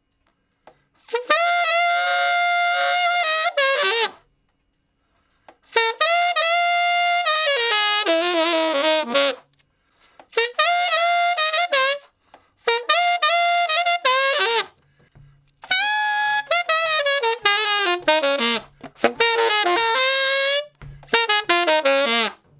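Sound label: Sax Shuffle (Bb), tenor sax; Crimson Blue and Fabulous Horncraft 4 RnB; Horncraft for R&B; Solos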